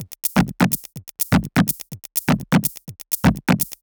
Top 125bpm, House Drumloops

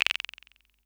SR95; Best Service; Dance Mega Drums

